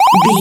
retro powerup item

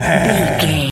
gorilla angry

